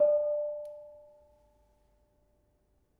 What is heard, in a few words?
Bonang Barung soft, gamelan